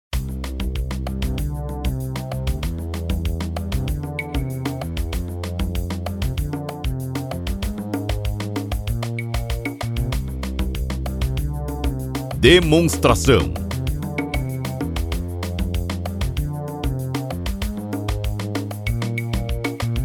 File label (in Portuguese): Trilha